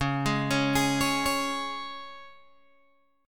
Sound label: Db 5th, Guitar, Open G